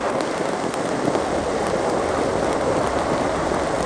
trashburner burn

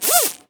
arm out; captainbigbutt; carbon miku

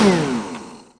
airsuck stop

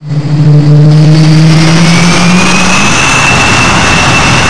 turbine large, engines; acf engines

